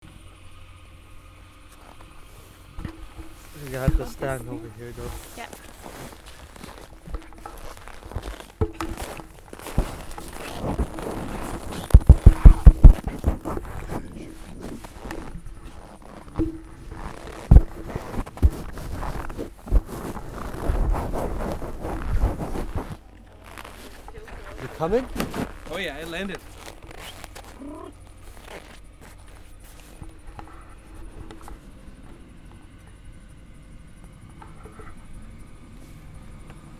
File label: Airplane landing mixed